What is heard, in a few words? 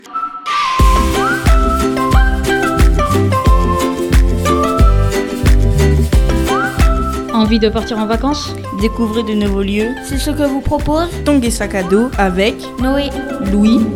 jingle; forestradio 4C